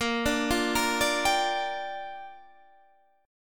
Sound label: Bb 6th Bb; arpeggiated; 18,17,15,15,15,15